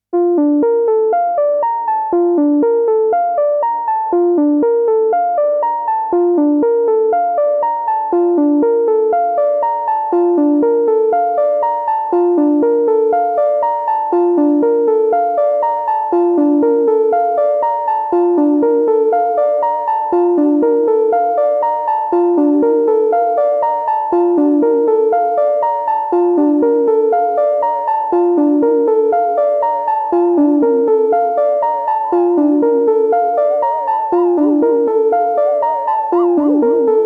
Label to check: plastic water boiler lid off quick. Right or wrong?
Wrong